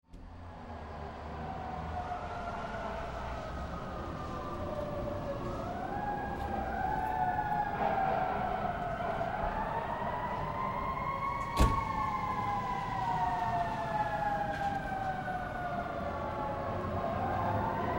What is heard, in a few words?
City Sirens, Multiple emergency vehicle sirens echo throughout city soundscape.; Other vehicles pass, car door slam; City Sound Effects; Lynne Music